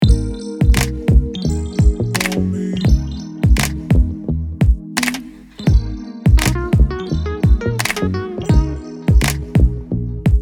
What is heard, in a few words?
r b active